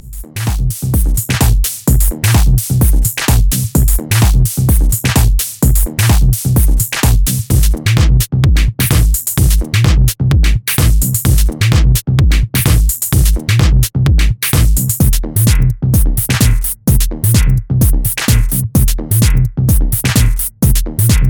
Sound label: tech house